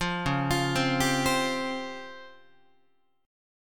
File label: C sharp Major 7th Flat 5th Guitar; C#M7b5 arpeggiated; JGuitar